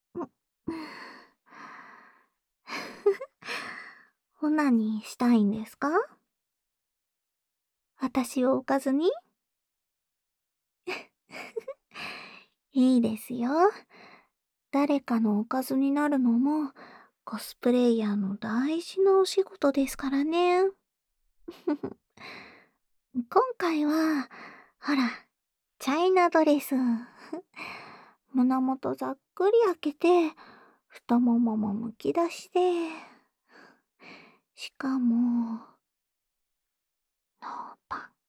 オナサポべろちゅー

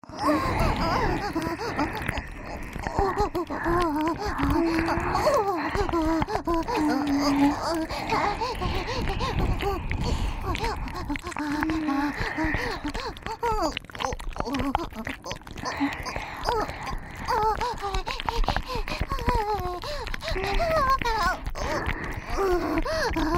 舌先れろれろ両耳舐め＋オホ喘ぎ声; 3WAYループ; オナサポ

